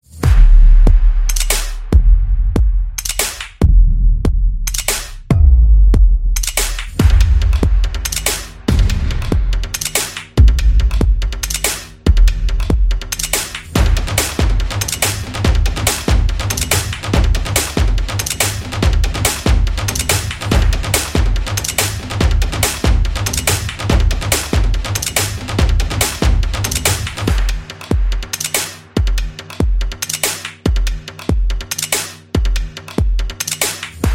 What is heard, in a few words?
Rhythm Beat; drumming action